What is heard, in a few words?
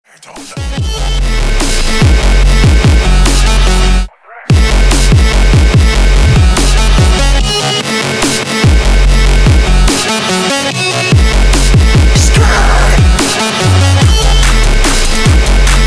trap